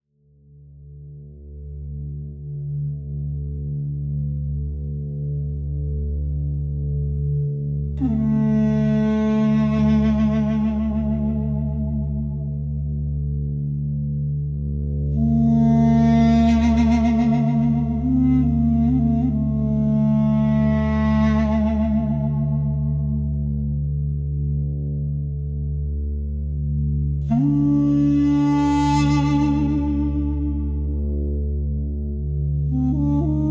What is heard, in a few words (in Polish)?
Gladiator Spirit, utwory na duduk